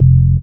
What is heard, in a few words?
DM ORG1, Dance Mega Synth